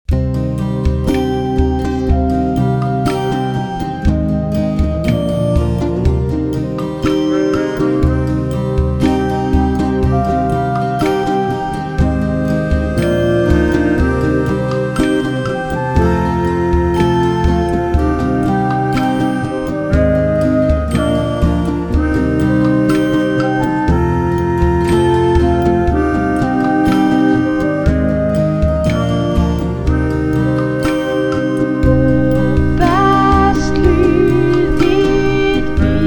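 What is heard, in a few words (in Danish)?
Ratalgas Hymne, middelalder og middelalderinspirerede sange og musik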